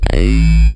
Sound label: Synth Bass; Vengeance Samples; VEE Synth Shots